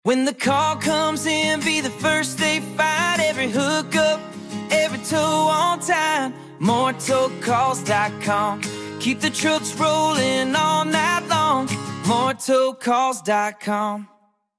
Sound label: jingle